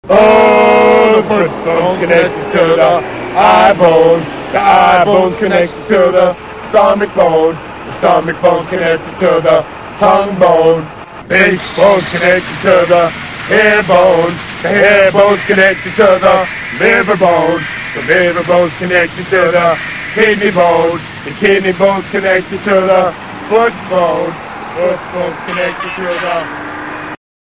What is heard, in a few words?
bone song